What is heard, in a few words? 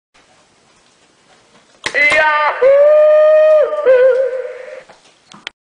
Yahoo Sound Effect